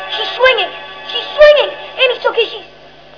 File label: swinging; Critters; Josh: She's swinging, she's swinging!!Annie it's o.k. she's.....